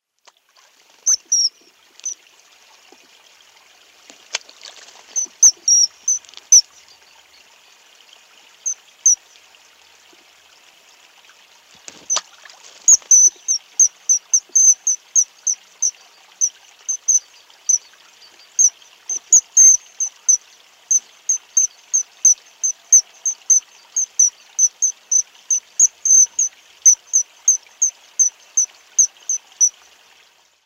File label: kingfisher